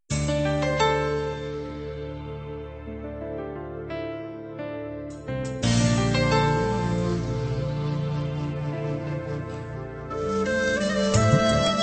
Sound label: Malayalam